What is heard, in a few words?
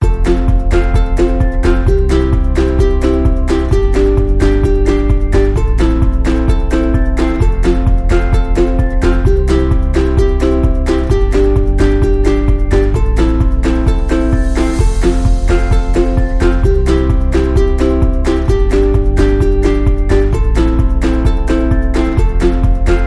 Happy Loop, ringtone; MIUI14; HyperOS 1.0